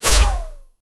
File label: clean ejection launch sound